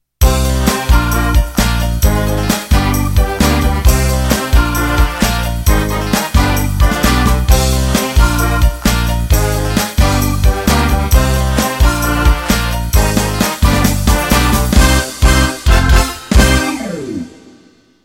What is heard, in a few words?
Chalypso End